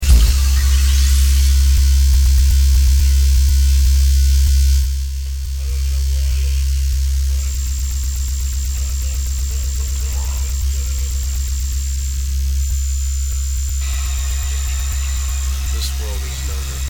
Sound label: splash